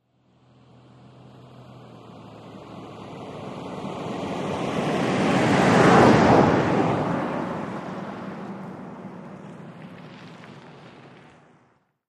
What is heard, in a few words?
Montero Pass By Short, mitsubishi montero ext.